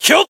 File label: attack, jp